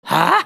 ahh; kayn heartsteel

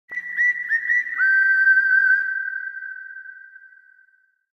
yankee whistle

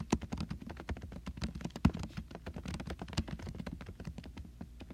typying

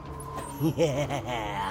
goofy ahh evil laugh

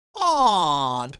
awww

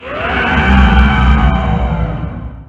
roar; perfect chaos

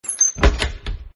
exit closet

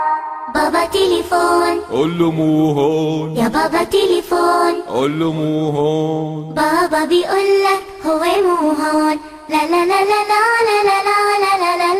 telephone

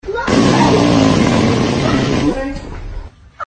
fart

